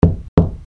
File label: knock